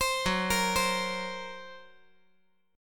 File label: F sharp Major Flat 5th; F#Mb5 arpeggiated